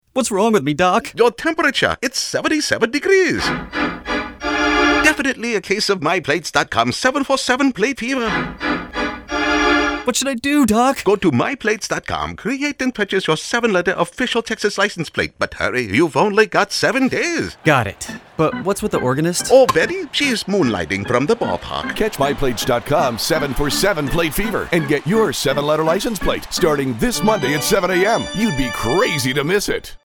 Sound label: Suspense; My Plates